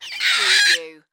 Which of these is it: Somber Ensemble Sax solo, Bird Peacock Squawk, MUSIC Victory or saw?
Bird Peacock Squawk